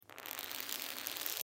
Lens Cleaner Spray, Lens Cleaner Spray Slow Long Plastic Bottle; Cleaning; Foley; Blastwave